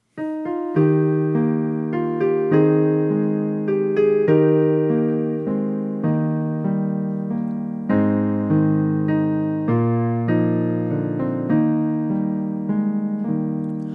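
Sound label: Psalm, Choir Practice Tracks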